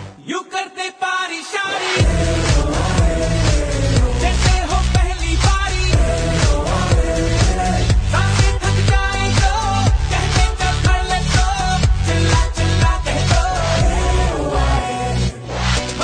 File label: Male